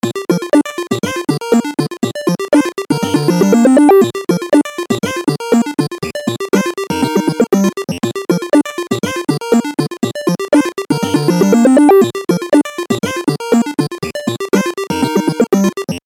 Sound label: 8 bit ringtone